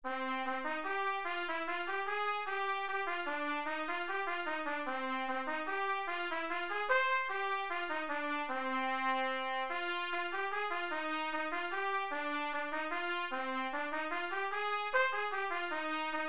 trumpet etude, 50

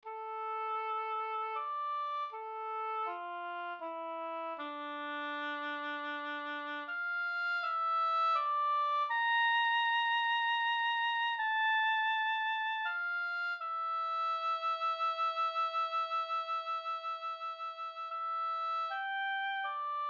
oboe etude, 80

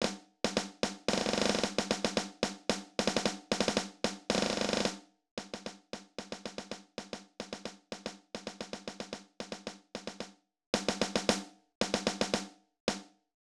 snare etude, 112

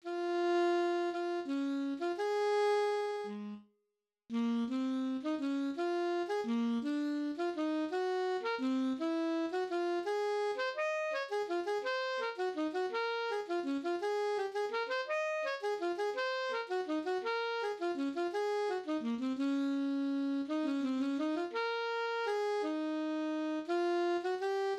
alto etude

